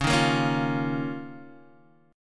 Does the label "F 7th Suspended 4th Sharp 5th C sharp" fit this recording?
Yes